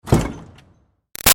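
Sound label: Door Metal Commercial Pull exterior Close; Doors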